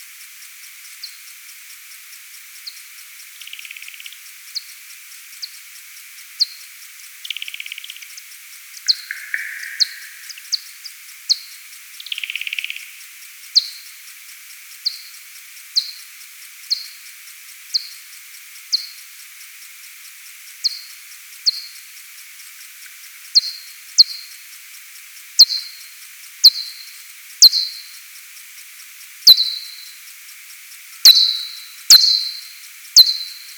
P. kuhlii FM sig trille FM apl; J-D-Vrignault

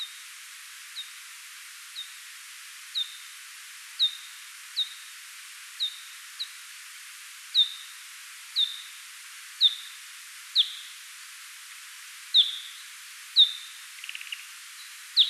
P kuhlii FM sigmoide expansion x10; MaineLoire; L-Bellion

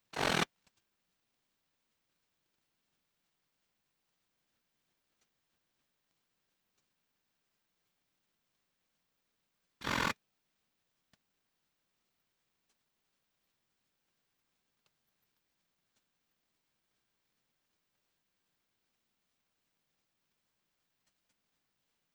R. germanica; Tarascon-13; J-Barataud

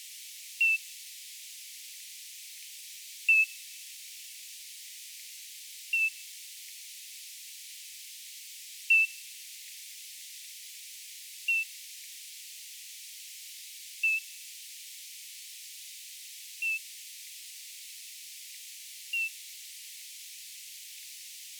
N azoreum chasse QFC; Bahia-Palace; A-Lefevre

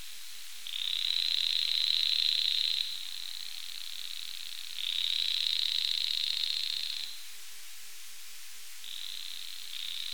Pseudoips prasinana EXPANSION x10; Croze19; M-Barataud

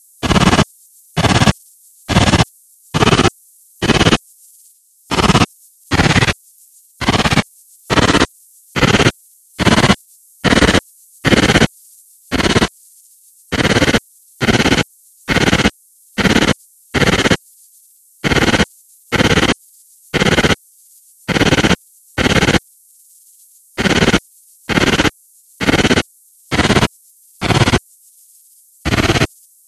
R corsicana; Asco2B

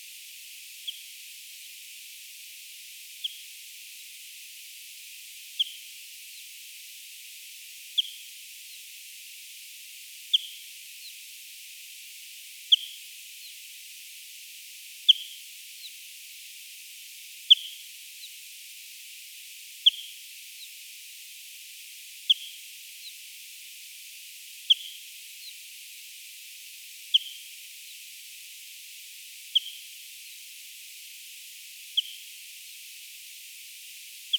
B barbastellus; Tenerife Herquio; Y-Bas

